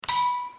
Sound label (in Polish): klik przycisku